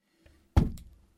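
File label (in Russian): хлопнула дверь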